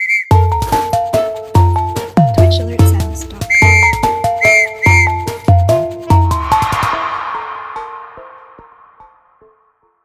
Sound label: Trap